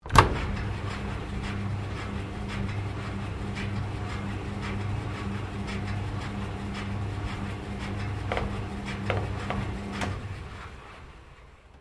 Clothes Dryer Operate, Domestic dryer; Laundry; Household

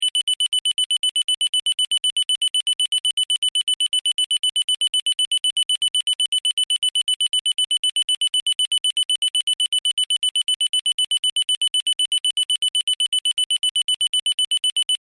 Household Smoke Detector Beeps; Kitchen

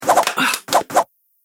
Ah High Speed Slap Fight; Andrew Fleming

